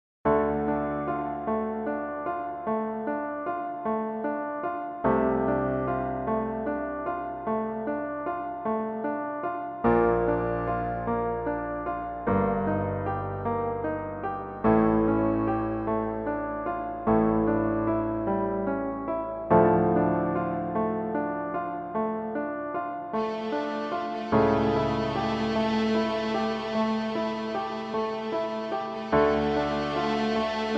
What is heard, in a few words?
Moonlight Cello Piano